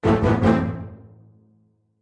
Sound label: orchestra hit melody kit; PADS and STRINGS